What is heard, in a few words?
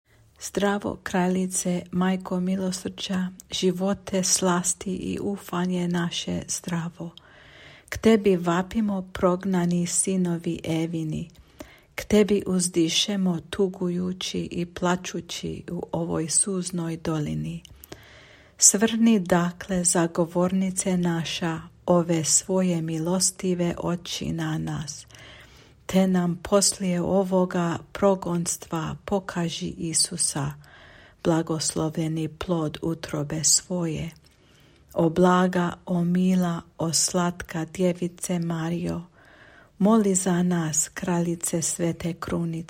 Hail Holy Queen Croatian